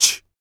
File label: FEM PERC, Vocal Jazz FX